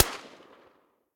silencer p99 mono distant, weapons

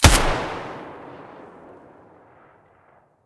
ash12 muzzle mono, weapons; STALKER; IWP

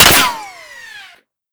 metall, bullet collide; STALKER; IWP

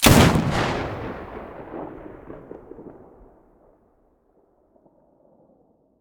muzzle spas12 mono, weapons

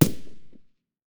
m4a1 silencer mono far, weapons

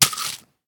Shell 12ga leaves; STALKER; IWP

